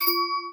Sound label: social notify major; tetrio